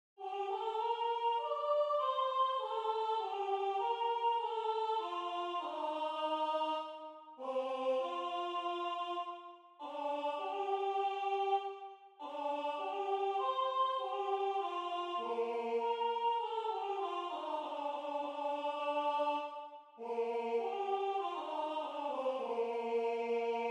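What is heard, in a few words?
Mixed Barbershop Soprano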